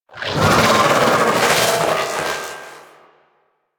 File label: Vocal Attack; Warframe; Sentients; Conculyst